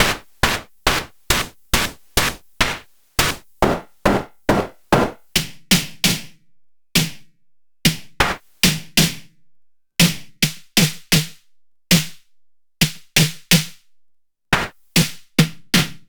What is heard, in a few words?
Snare High Quantity